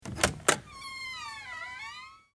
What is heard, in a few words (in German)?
Tuer